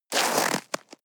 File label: step snow walk single hard; natur-tiere; schnee